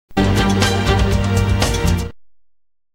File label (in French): jingle pub; cineplus